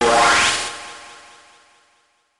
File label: teleport in; RobotRescue; music